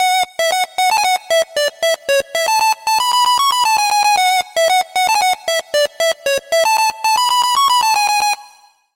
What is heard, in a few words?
Lead, Sylenth1; Routed Sound; Sylenth1 Expansion - Star Scavenger Vol.1